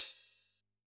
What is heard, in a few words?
Hf Hat, drum